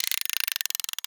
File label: Alarm Clock Wind; Haunted Bloodlines; SFX Producer